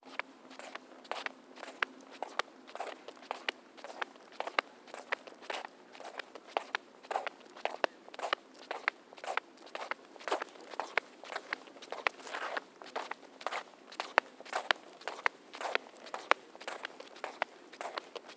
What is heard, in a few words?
Footsteps cement